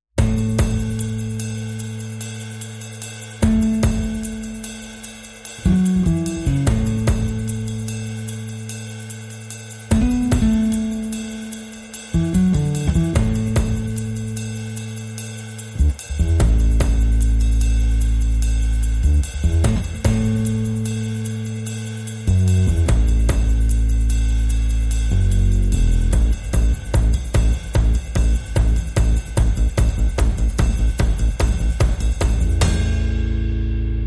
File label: Drums and Bass Mix